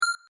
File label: bin click